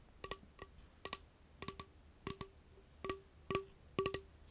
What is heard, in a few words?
Tapping